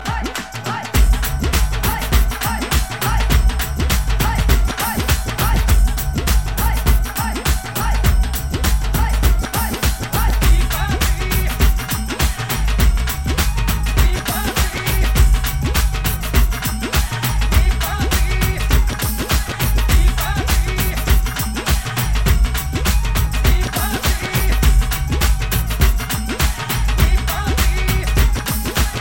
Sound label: Dhol